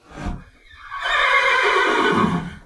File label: Loud suck whinny